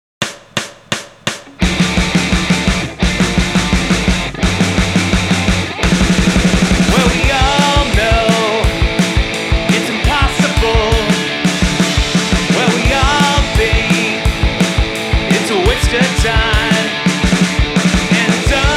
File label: 171bpm track